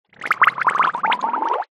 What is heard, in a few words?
cartoon bubbles